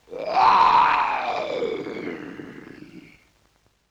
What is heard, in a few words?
LoFi Synthetic Lion Roar; Vintage Cartoon; Comedy Vocals